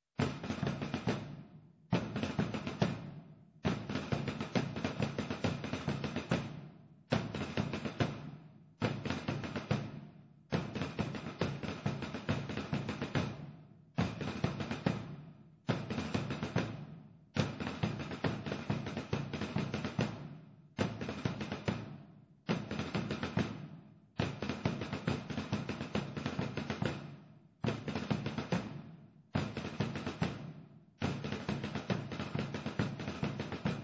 romper la hora, tambores; Andorra

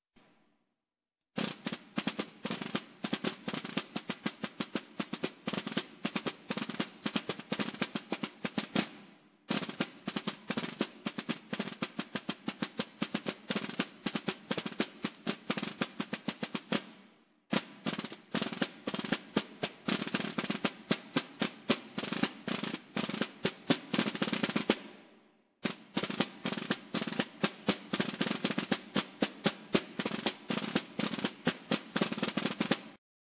palillera, tambores; Urrea de Gaén; La palillera

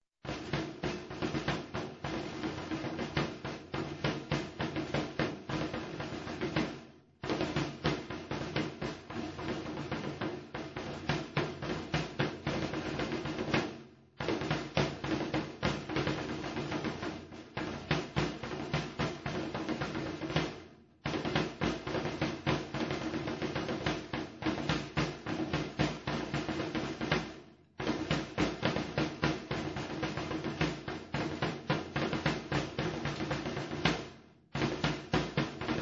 imagenes, tambores; Alcorisa

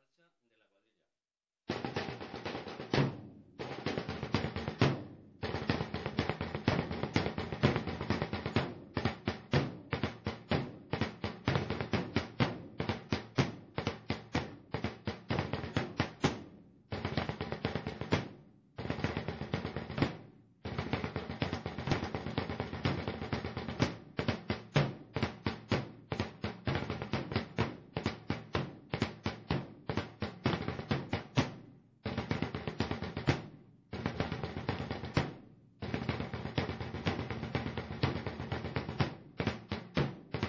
marcha, tambores; Marcha 3; SAMPER

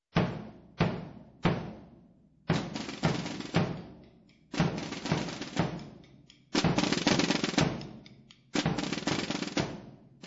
palillera, tambores; La Puebla

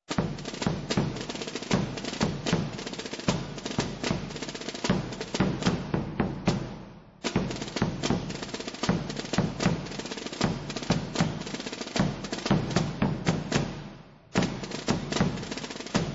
marcha, tambores; Hijar; Marcha 1

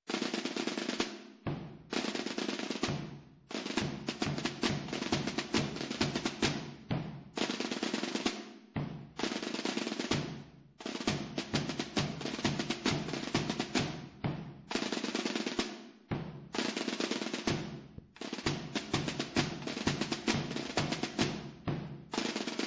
marcha, tambores; ALBALATE

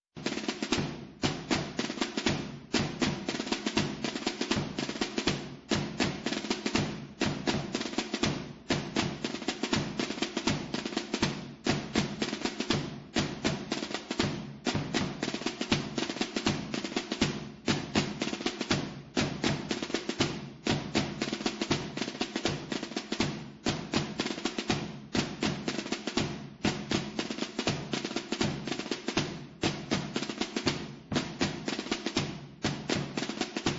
jota, tambores; Albalate

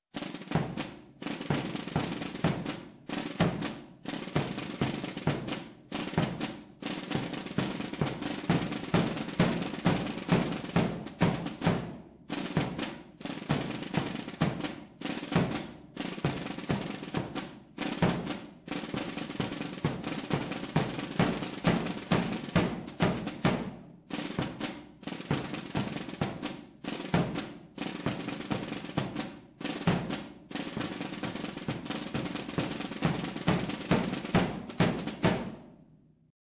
agachadico, tambores; La Puebla; El agachadico

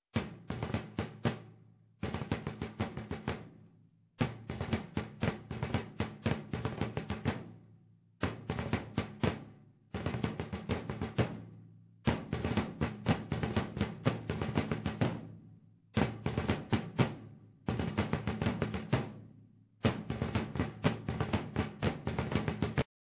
procesion, tambores; SAMPER

